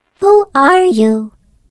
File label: turret pickup; video games; npc; source engine; portal; turret floor